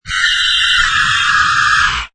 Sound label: Alien Screams, Alien monster or fantasy jurassic creature screeching; Alien hatchling; screaming in pain, excitement, attack or death; Lynne Music